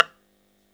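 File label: EL BZ PIZZ E, bouzouki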